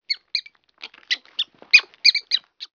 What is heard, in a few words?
squirrel